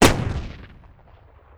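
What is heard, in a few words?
balloon explosion nuke; Politburo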